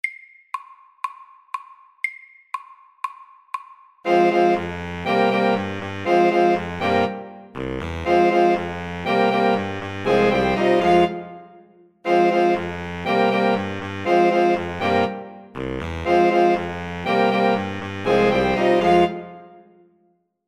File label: Jam MEDIUM